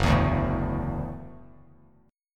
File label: Gb Minor 7th Sharp 5th, Bass Standard; strummed; JGuitar